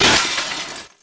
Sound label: jewel break